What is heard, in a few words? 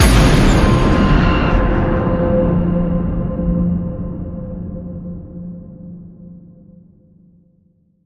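hit horror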